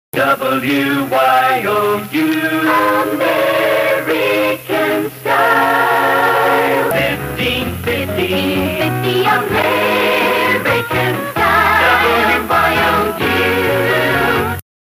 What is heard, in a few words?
jingle; WYOU; American Style